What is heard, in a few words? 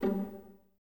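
Violas G 3 pizzicato, String Ensembles; Miroslav Vitous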